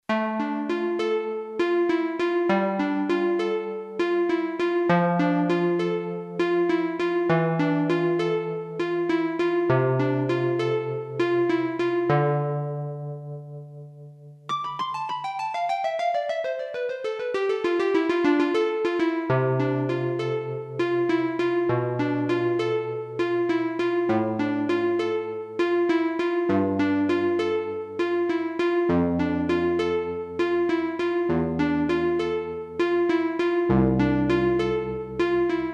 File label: V-Piano